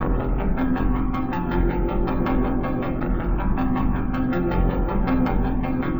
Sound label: Seq Train tech, Drum & Bass; Sonic Foundry (Sony Creative Software); Knutrix Drum & Bass